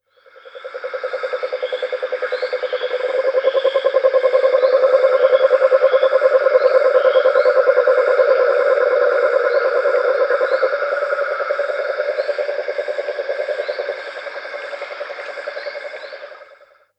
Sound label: Toads [Bufo Ictericus] + [Bufo Crucifer] (Background)